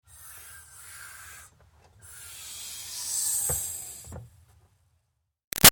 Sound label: Car Highlander Trunk Air Hiss; 2005 Toyota Highlander V6; Cars; Vehicles